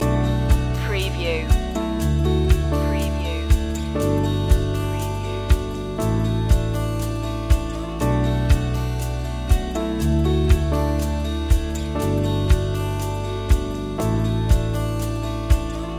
all good people loop

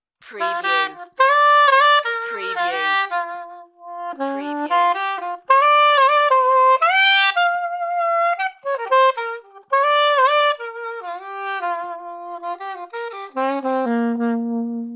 Sax Beautiful Playing

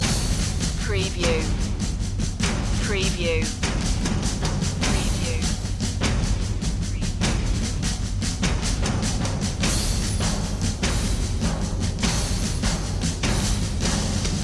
percussion loop

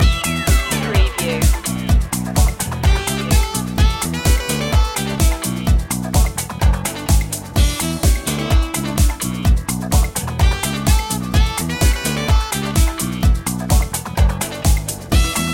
funky juice loop

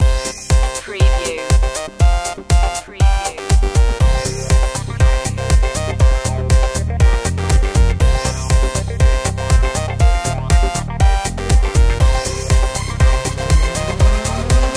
dance floor

